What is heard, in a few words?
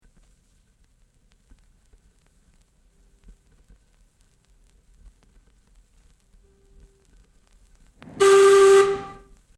Whistle